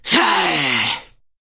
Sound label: Attack; Ionar